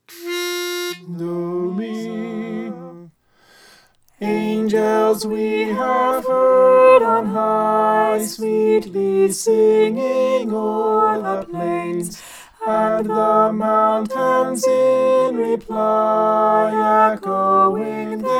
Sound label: soprano